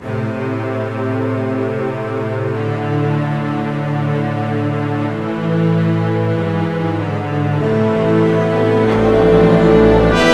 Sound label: battle